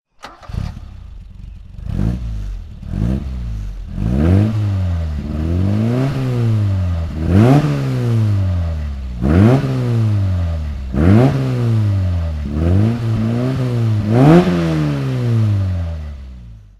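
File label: ford escort mexico throttle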